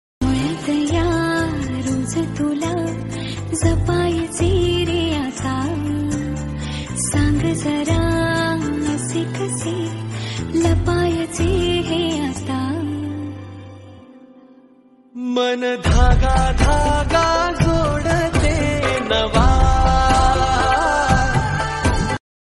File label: marathi ringtone marathi lofi lofi love